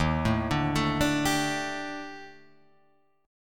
Eb Major 7th Flat 5th; EbM7b5; arpeggiated; JGuitar

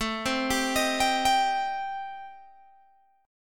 A-Minor 7th Flat 5th, Guitar Open G, chord

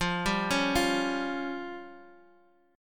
C sharp Major Flat 5th, chord

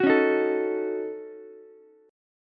D sharp Minor 7th Flat 5th D sharp, down, Ukulele; D#m7b5 strummed

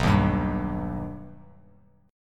B Augmented B Bass Standard, chord; strummed; JGuitar

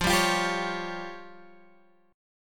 F Suspended 2nd Sharp 5th F down Guitar Open G

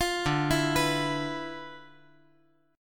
D Minor 6th Add 9th D

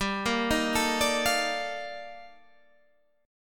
Eb Major Sharp 11th G

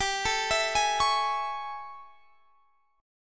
A Major 7th G

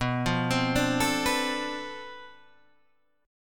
B Minor 7th Flat 9th Flat 5th B, guitar chord

